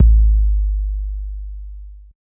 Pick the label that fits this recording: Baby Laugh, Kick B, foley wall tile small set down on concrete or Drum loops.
Kick B